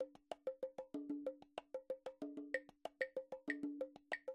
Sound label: latin mulitchannel